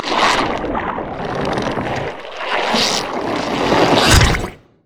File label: elastic slingshot; LoL; zac base